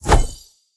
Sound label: swing; Smite; Tiamat